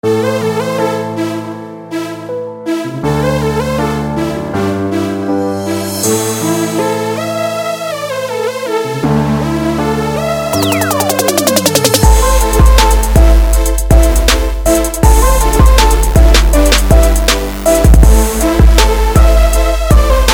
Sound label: Free Hip hop beat